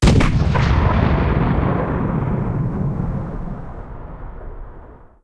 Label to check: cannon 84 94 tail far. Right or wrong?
Right